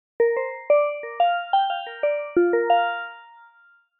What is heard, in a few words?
Soft Bell